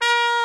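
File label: brass bright trumpet, cheese trumpet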